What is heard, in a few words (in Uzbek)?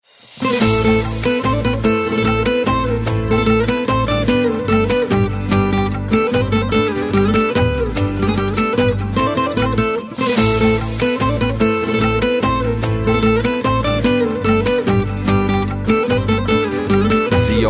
azer gitara music; gudok